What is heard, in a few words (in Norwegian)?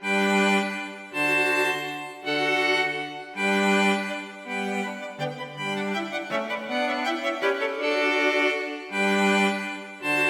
Englois med menuett for strykekvartett